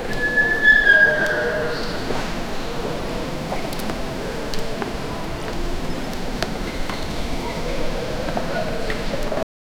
medieval alley ambience, market

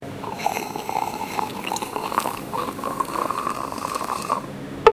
sound of drinking coffee

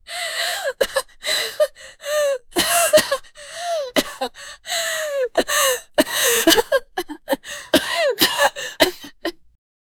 a sudden strained cough, gasping

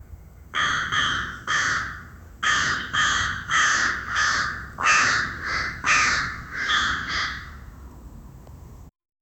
crow, vocalization

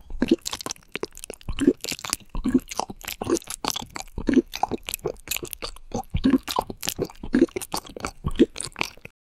a realistic and natural, mouth

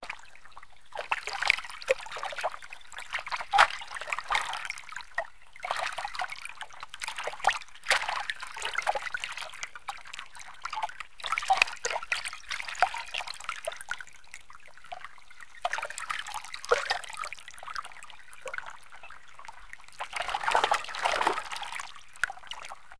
gentle lake sounds

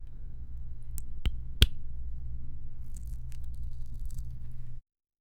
touch liquid with palm

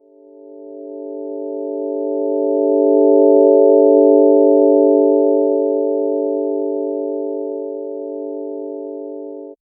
the cosmic chord rises to

